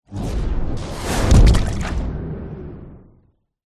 fantasy magic spell dark whoosh into impact small water splash; zapsplat